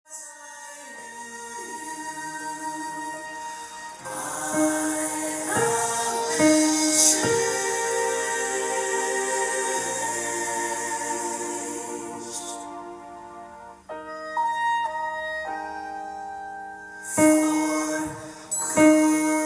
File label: soprano